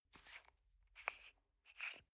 toothpaste screw cup open soft

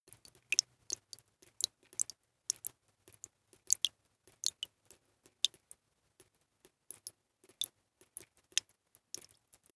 dripping sequence

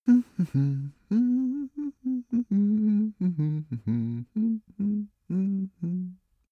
cartoon male hum sequence

